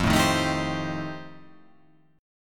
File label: F 9th F, chord